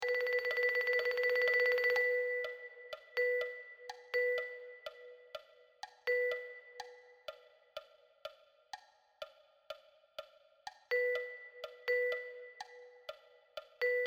Vibraphone

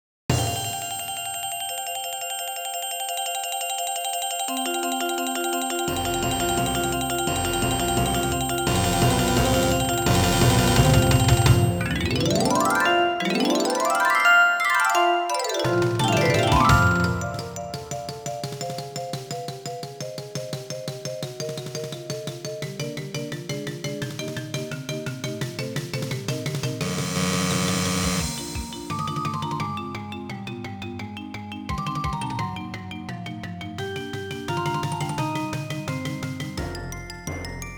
Percussion

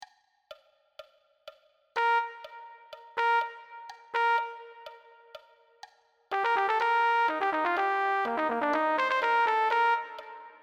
Trumpet

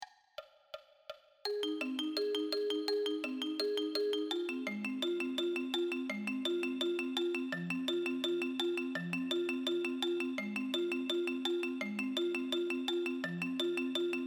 Marimba

